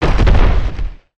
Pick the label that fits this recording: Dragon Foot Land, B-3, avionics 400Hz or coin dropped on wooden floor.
Dragon Foot Land